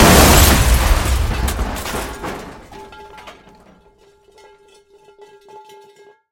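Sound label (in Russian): бьющаяся посуда с катающейся по полу металлической крышкой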